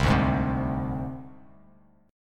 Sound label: C Suspended 2nd Sharp 5th, down; Csus2#5 strummed; JGuitar